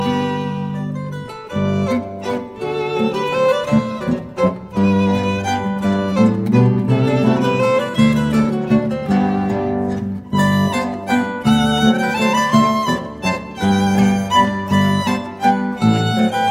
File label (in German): Bauerntanz, zither; Bauerntanz aus Chrobold